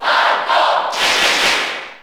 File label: Cheer Italian; SSBU